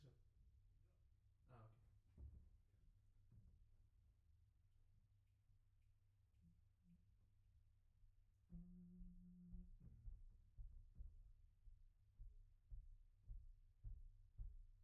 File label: Piano B